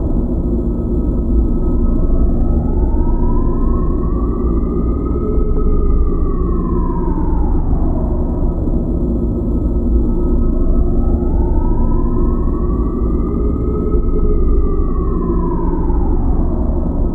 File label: siren; ゆめ2っき